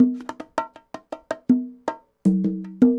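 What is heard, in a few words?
conga bongos, latin, 80bpm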